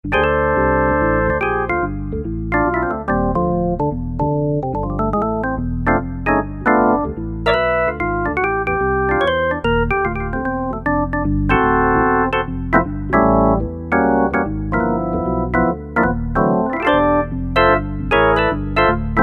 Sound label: E. Organ; Roland U-220; Factory Timbres